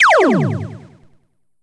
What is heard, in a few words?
Laser; SSV-Music